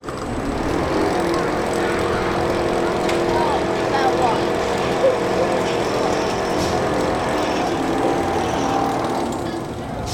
leisure fairground ride rollercoaster 3 cars in succession; dragons fury